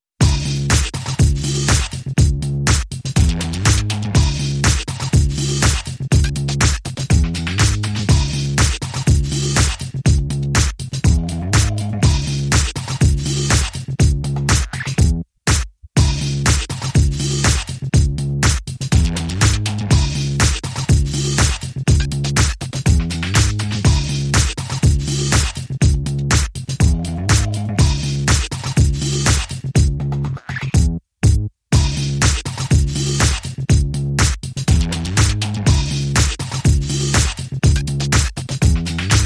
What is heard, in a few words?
Drums And Bass Mix